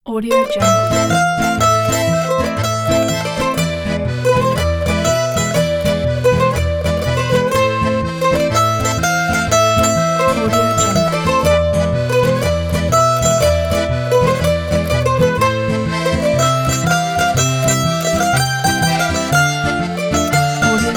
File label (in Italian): spaghetti e mandolino